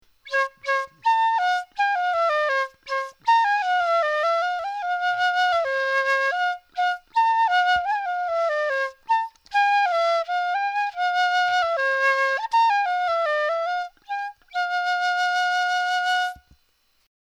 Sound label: BAMBOO C SOPRANO FLUTE